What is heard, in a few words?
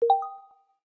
hulahoops unlock, ui; LG L18VC Classic US Celular